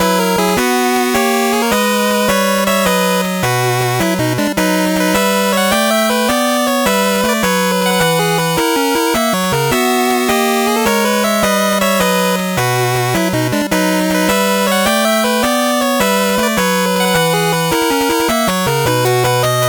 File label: 8bit bgm slow